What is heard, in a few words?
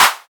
clap one shot b key, Royality free clap sample tuned to the B note. Loudest frequency: 3283Hz; percussion single hits one shots